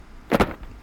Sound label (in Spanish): Caidota